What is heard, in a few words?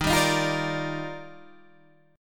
Eb Major 9th, Guitar Half Step Down